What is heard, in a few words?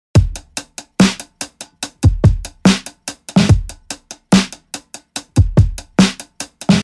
72 DRUM LOOP DAINTY SIXTEENTHS